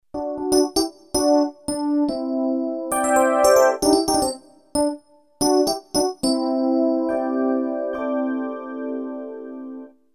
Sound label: rhodes, Roland JV2080; Rhodes: two